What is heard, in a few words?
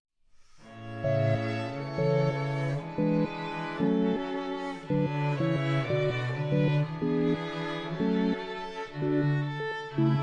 Sync Sine Soni